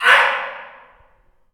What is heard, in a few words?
Male Yells Ai